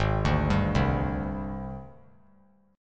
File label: Ab Major 7th Flat 5th Ab, down; AbM7b5 arpeggiated; JGuitar